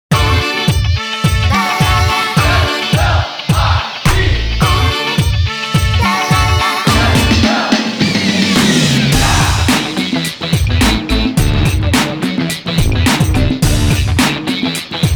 Slip Out (bounce in beat mix); DanceDanceRevolution X; arcade; PS2

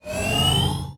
Vehicle Move In

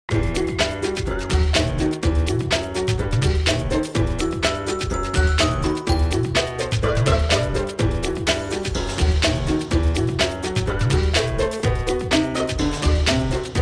Cave

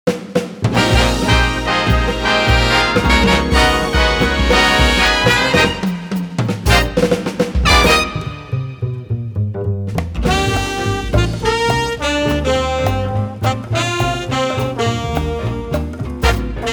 Jazz; the k.k. sessions animal crossing

